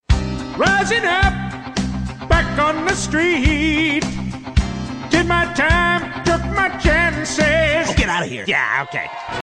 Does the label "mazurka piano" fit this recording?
No